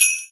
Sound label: xmas sleigh bells